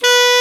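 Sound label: tenor sax hard B; Northstar - New Gold VOL-1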